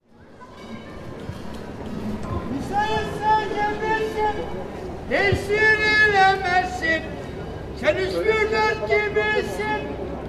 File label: song about amerika istiklal